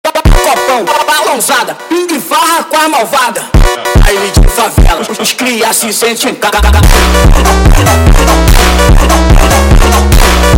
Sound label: Funk sped Up